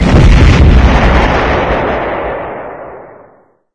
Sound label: Explosion; MapleShrine; mkxp; gameasync